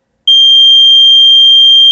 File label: beep; sensors